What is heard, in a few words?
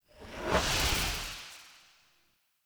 Soul Steal Combined; Powers